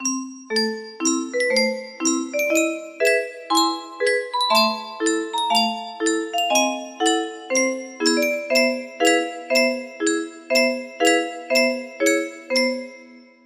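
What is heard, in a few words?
lullaby, music box melody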